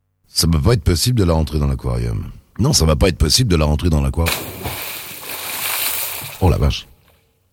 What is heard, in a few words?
jingle; dorval; supernana